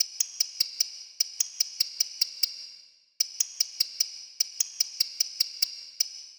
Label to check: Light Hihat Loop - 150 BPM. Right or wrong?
Right